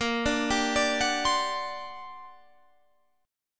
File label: G Minor Major 11th, Bb